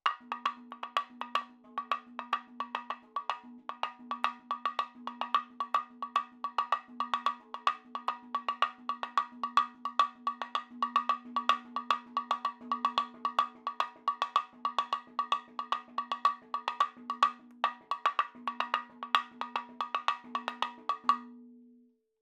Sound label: 110 7 8 MADALAM, percussion